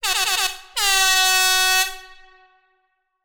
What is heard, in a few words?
musical air horn dancehall style; sound effects one